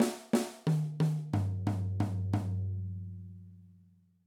Eighth Note Example, drum

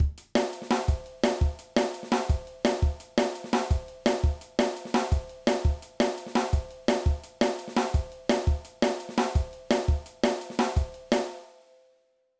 Groove Drum and Bass Drum Patterns

